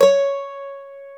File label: mandolin, Stereo Mandolin